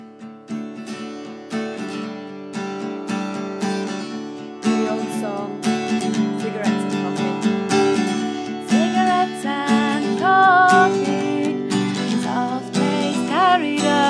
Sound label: bedroom singing an old song